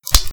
Pencil snap

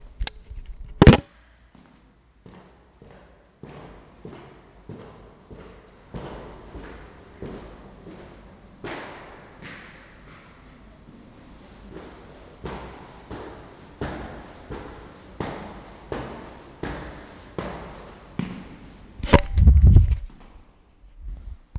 Footsteps Upstairs

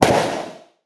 Gunshot

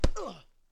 Punch

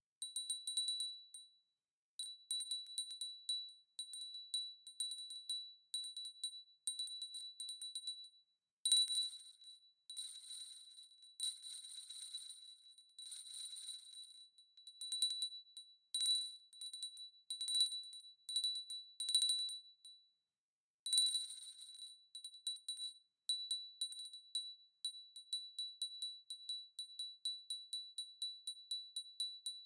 Small Glass Bell Ringing

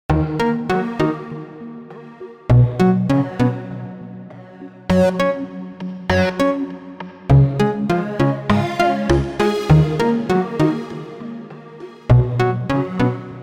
Melody 100 Bpm

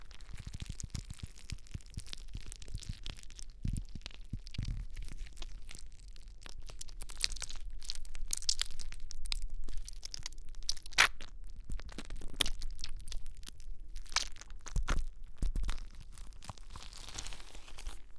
Slime

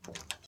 Door Open

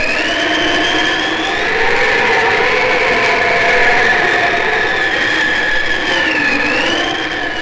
Blizzard Looped

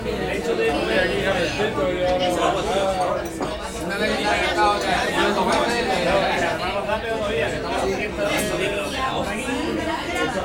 In A Bar

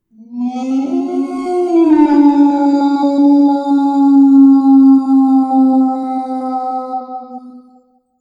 Giant Wolf Howl

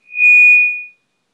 Mic Feedback Short Mid

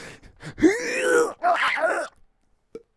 Thin Male Heavy Lifting Uuuugh

